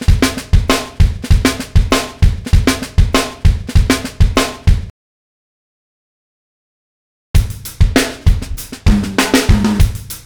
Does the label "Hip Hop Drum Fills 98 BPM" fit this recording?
Yes